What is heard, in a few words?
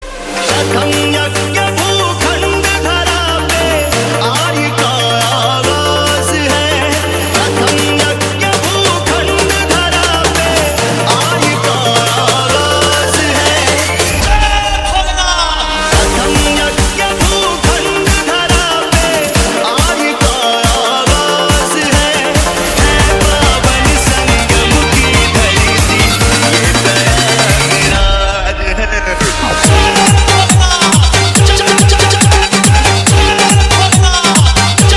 Dj Ringtone